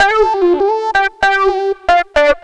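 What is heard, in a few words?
synth, roots funk; USB Soundscan vol.41 - Roots Funk; AKAI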